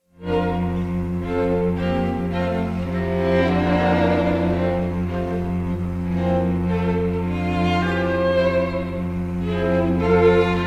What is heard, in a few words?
string quartet in C major; ludvig norman; op 42; 1871